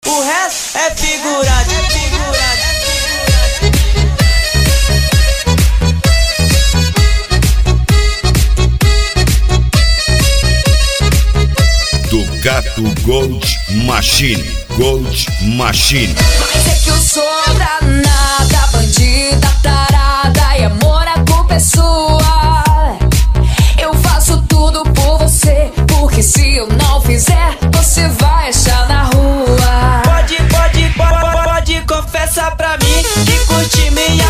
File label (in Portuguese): eletrofunk